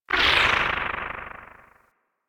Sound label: Hub Cephalon Statue Dissolve; Warframe; Ambience; Tenno; Syndicate Cephalon Suda